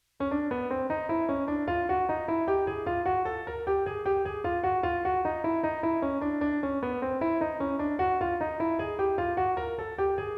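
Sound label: Whole Tone Scale Tone Embellishment, jazz piano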